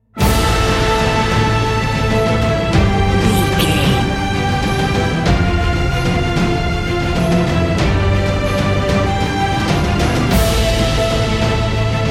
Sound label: battlefield epic cinematic